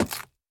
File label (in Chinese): 斧头去皮声